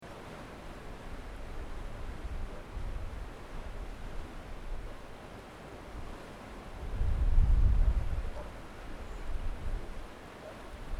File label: river